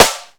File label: SLAP SNARE; E-MU Formula 4000 Series Vol. 1; Hip Hop Nation